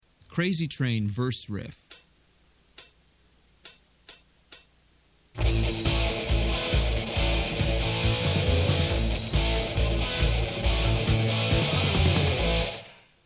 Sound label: verse riff